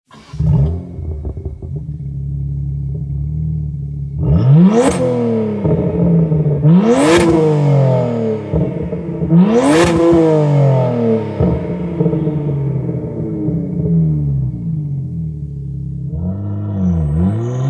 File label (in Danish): BMW 1m akrapovic udstødnings lyd closed valves